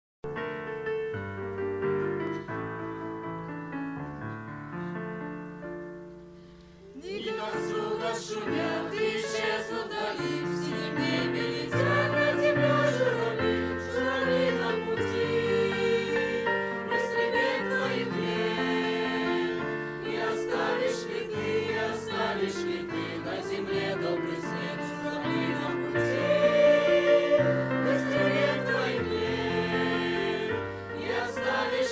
пение Воронежской мол; 2015 Молодёжный лагерь. Курск

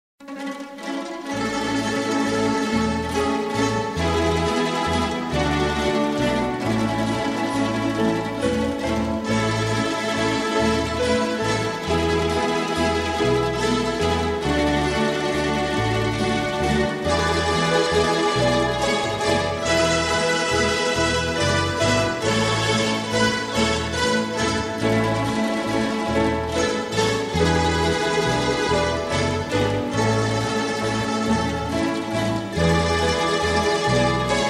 Музыка; Молодёжь Омского объединения Церквей ЕХБ - Сборник песен (2007)

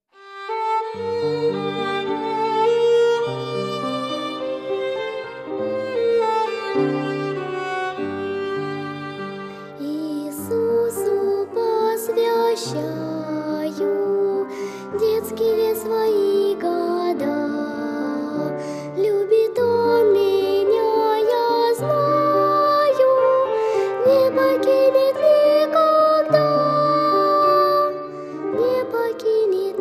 Иисусу посвящаю пение

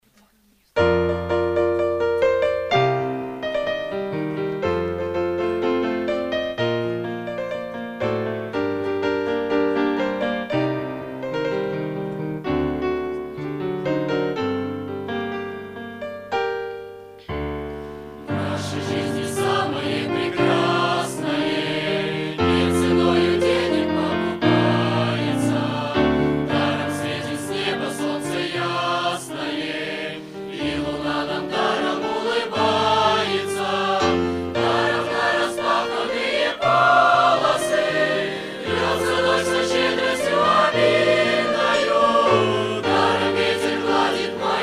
пение Курской молодежи; 2015 Молодёжный лагерь. Курск

